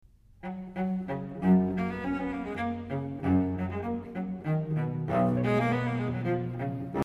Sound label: Cello